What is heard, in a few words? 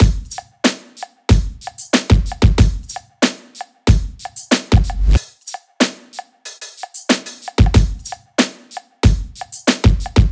drum loop 93; Pop; Drums; Full Drum Loops; Slate; SD PASADENA Pop Sample Pack